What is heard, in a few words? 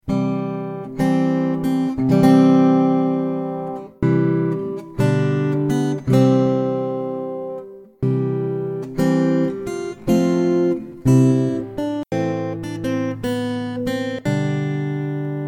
Bridal Chorus; 16 bit